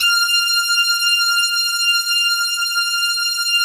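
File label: synth brass f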